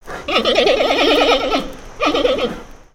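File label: Pets Bear Panda Ecstatic; creatures; Lego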